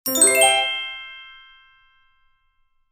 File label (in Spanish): acertar puzzle